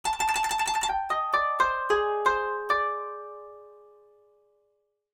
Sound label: Koto